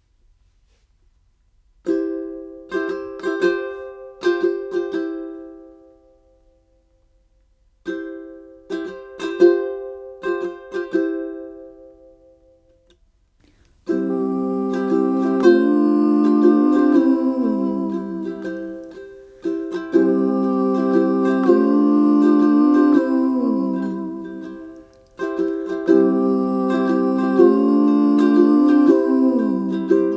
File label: bg vocals